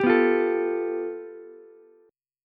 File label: A 7th Suspended 2nd Sharp 5th, Ukulele; A7sus2#5 strummed; JGuitar